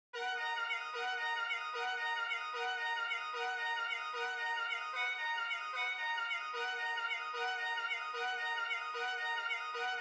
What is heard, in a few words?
150 BPM D Min Violin; Loop Stems; Cymatics; Rowdy